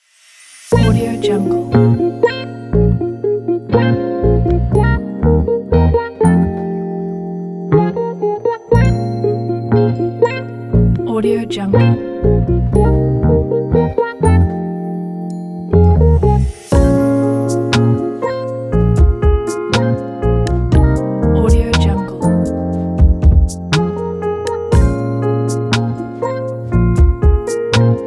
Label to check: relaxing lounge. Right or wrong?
Right